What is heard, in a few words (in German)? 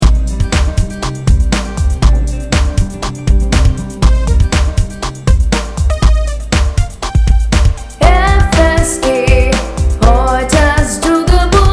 Jingle Projekt; steinhundert